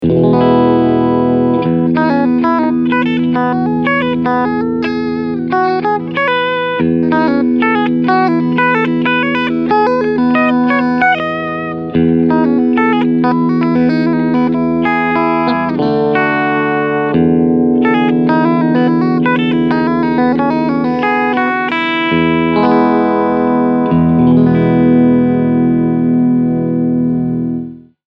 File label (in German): Clean Steg Mitte